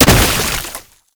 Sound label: Archgun Proj Explosion; Warframe; Weapons; Archwing; Tn Nokko Archgun